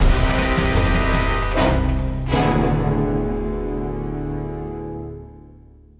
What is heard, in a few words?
Action END